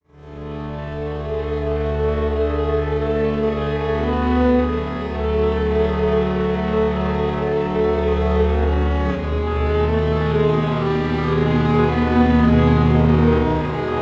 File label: strings; timestretch; bias peak